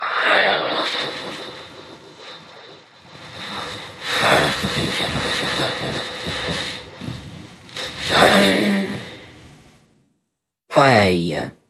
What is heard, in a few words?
jingle